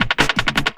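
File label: LOOP snare drum, Drum & Bass, 160 BPM; Ueberschall; Drum & Bass Resonance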